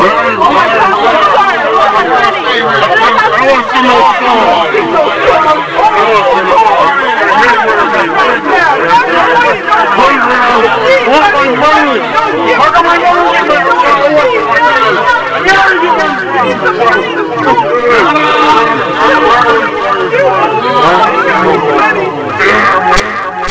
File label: mob, ambience